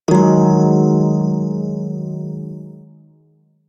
Prime Shawzin Yo Chord E; Music; Warframe

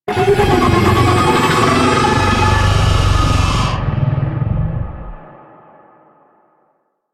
Arachnoid Camper Vocal Death; Warframe; Corpus

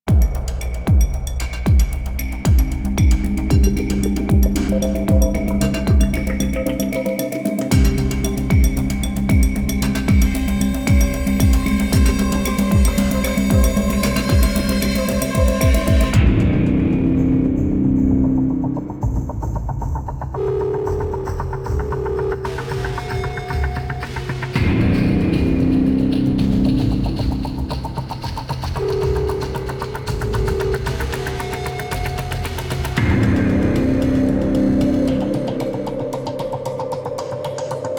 Cinematics Music; Warframe; New War

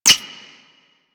Ricochet; Warframe; Cowgirl

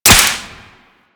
Whip Impact Default, weapons; Grn Whip

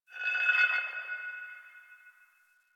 Exterior Man Hole Drag; Warframe; Ambience; Nineteen Ninety Nine; DE

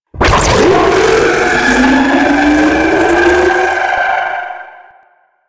Raptor Laser Barrage Charge; Warframe

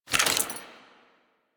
Generic Foley; Warframe; Warframes; Dagath

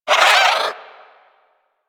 Bird Tranquillized; Warframe; Hunting Critters; Sawgaw

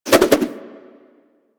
Nunchaku Spin Whoosh; Warframe; Tenno

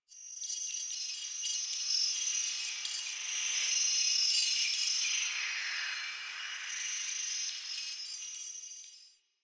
Data Texture; Warframe; Venus Open World; Venus Solaris United

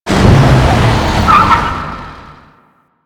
APC Move Stop; Enemies; Lasrian; Warframe

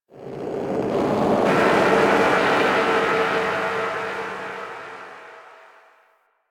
Mid Texture; Warframe; Quests; TheNewWar; Ambience; Zariman

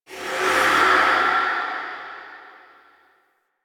Evolving Weapons UI Open; Zariman

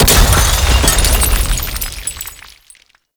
Ocean Glass Explosion Large; Ambience; Warframe; Grineer Ocean

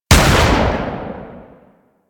Laser Aim Rifle Alt Shot A, Weapons; Grineer

